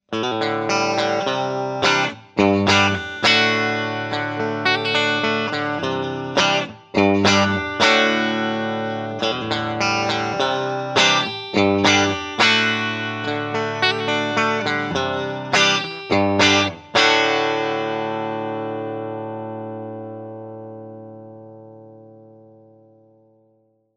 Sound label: Powerplus bridge single coil